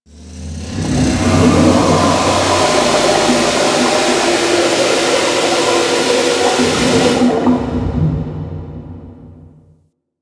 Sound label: Ocean Steam Blast Large; Warframe; Grineer Ocean